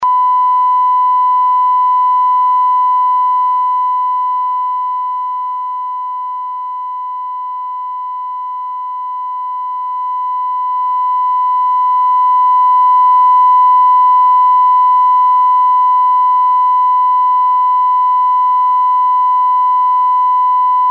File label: Varying Amplitude